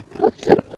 idle, endermen; mob; Minecraft

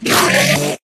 scream, endermen; mob; Minecraft

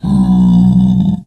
zombie pig; mob; Minecraft

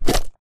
slime attack, mob; Minecraft

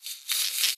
say, silverfish; mob; Minecraft

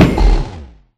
hit, enderdragon; mob; Minecraft

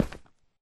stone, step; Minecraft

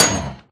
hit, blaze; mob; Minecraft

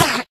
hit, endermen; mob; Minecraft

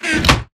chest closed; Minecraft

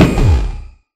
hit, enderdragon; mob; Minecraft

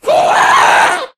scream, ghast; mob; Minecraft

